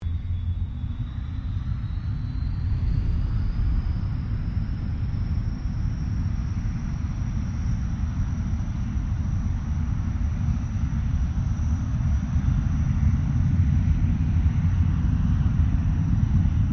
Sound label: AIRCRAFT CA-27 SABRE TAXI DISTANT; CA-27/F-86 Sabre; Airplanes; Vehicles